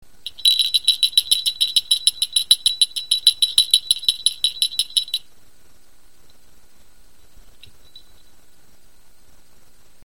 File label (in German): blumenschelle